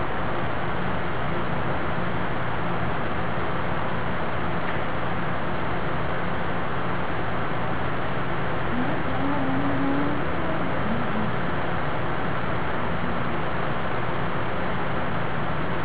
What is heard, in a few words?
singing